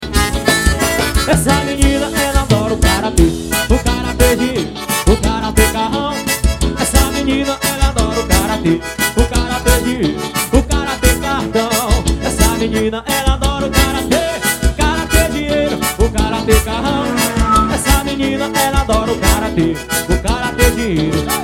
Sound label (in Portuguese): forro; manaus am